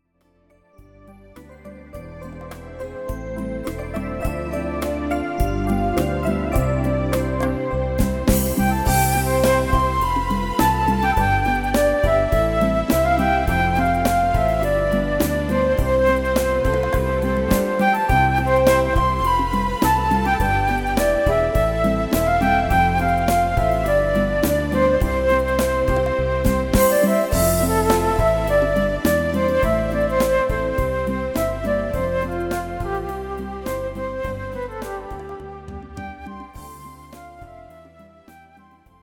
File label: RUMBA